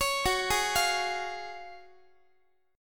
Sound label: Gb Major 7th Suspended 2nd, Guitar; GbM7sus2 arpeggiated; x,x,16,16,12,16; 4A,4E,5A,5D,5G#,6C#